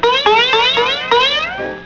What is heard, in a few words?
bing bin; geraeusche virtuell